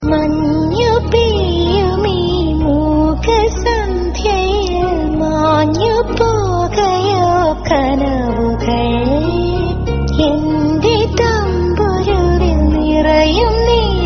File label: Malayalam Love Song